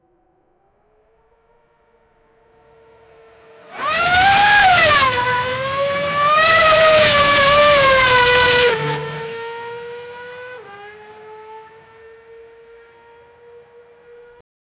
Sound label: f1 race fast speed engine; motorsport